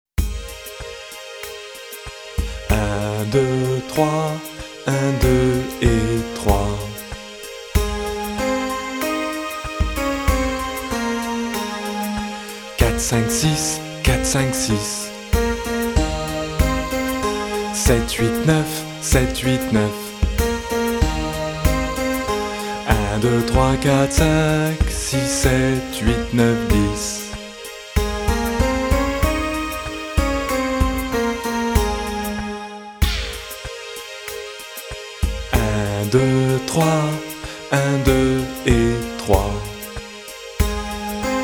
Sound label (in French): VERSION KARAOKE; Alex et Zoé 1